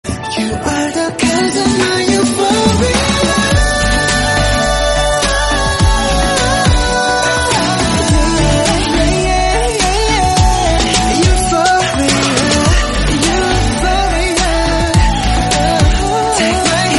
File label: Korean Song